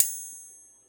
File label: chime; gesource; player